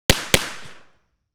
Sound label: Vz61 Scorpion 9mm 1.5m behind right clean Double shots